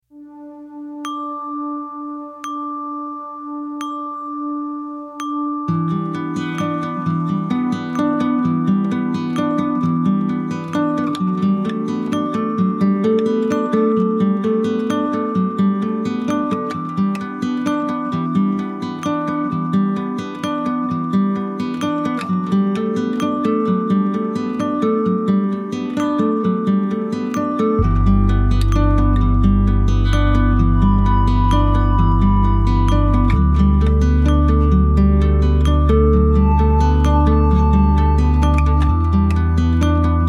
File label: background music